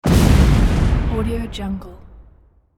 exploding impact with fast whoosh in

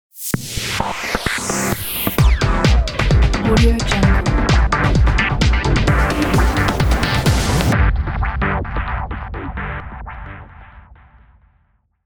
3d logo; frontmusic

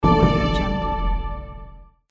rpg fantasy alert; backgroundsounds

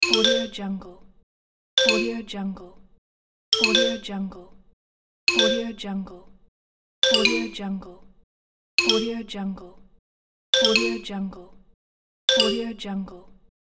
xylophone alert

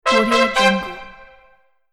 quick fanfare

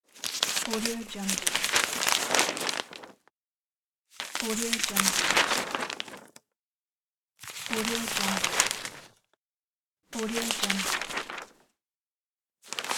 newspaper; sounddivision

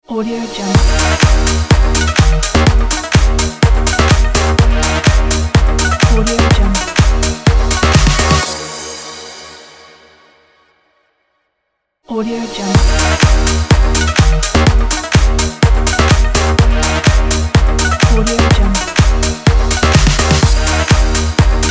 techno pop logo

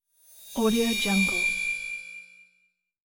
transporter short high pitch ring; hollywoodedge

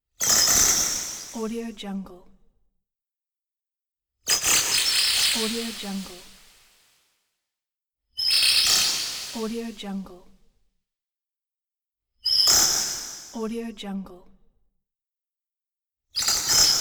high frequency beams

